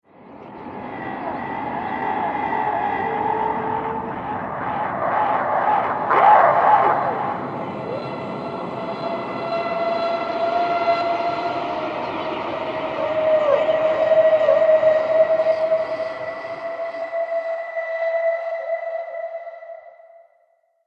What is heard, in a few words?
Screams Horror Atmosphere, scary soundscape